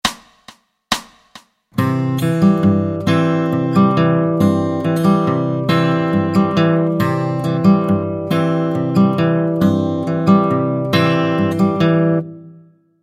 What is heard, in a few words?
Travis Picking; our new set of notes are run through the ol' hemiola ringer